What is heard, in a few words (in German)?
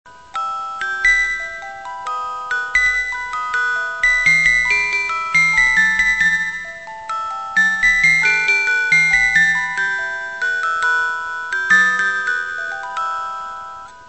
orgel